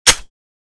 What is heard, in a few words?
chamber, glock; weapons; arccw ud